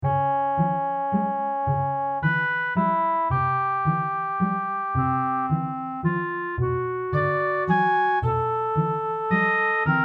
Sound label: Barcarolle; Op 23